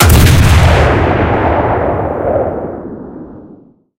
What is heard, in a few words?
cannon 120mm qqf shot, cannons tanks; War Thunder